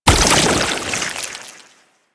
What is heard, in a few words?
Aura Toxic Impact; Warframe; AI Leaders